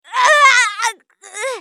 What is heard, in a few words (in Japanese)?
マスケッティア女 ダウン